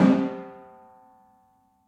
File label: Timpani; Ableton; Orchestral Mallets